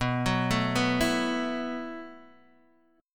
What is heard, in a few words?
B 7th Suspended 4th B down Guitar DADGAD